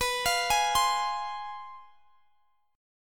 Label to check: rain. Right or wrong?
Wrong